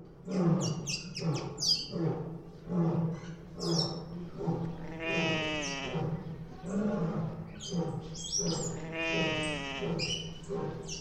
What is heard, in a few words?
soundscape zoo